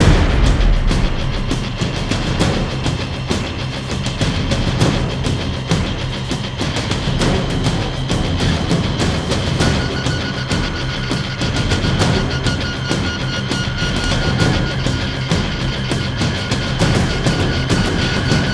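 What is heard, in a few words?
music, drums guitar loop; transformers war for cybertron gamerip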